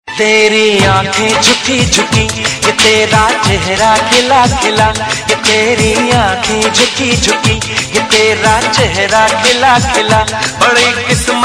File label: hindi song ringtone, bollywood